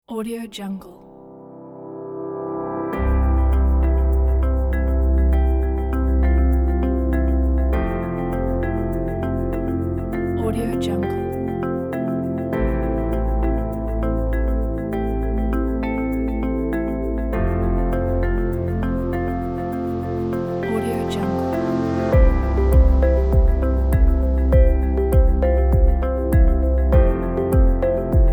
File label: soft simple ambient